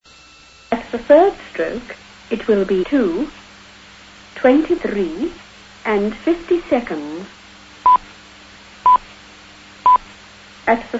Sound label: old uk; vintage telephone sounds; miscellaneous vintage sounds